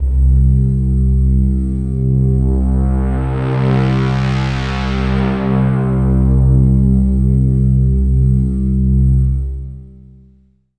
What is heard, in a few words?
Yamaha SY22 Prophecy C2